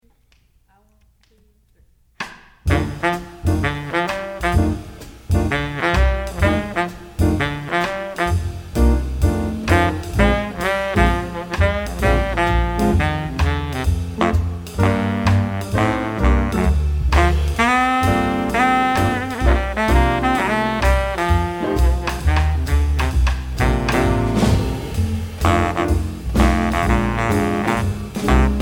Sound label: Blues bari sax; All-State Jazz etude